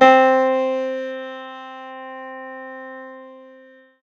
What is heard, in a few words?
piano note; 07-piano; nyquist